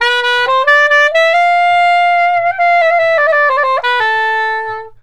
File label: SAX